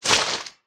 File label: creature slime